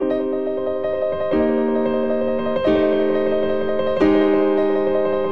Progression Descending Tremolo C Minor 90 bpm, Grand Piano; MIDI Clips; Ableton; Factory Packs